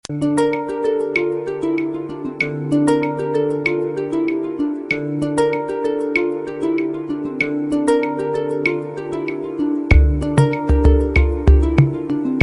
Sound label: tamil iraq ringtone